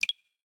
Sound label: Unlock, phone ui; Gx